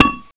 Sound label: firecracker bounce